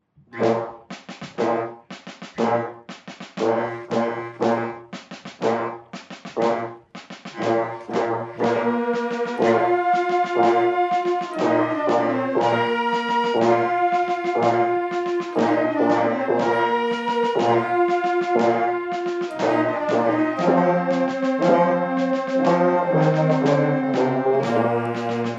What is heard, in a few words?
beginner band massed band virtual ensemble; Burnaby